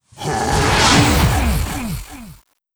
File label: fs Blue Heavy Attack; netent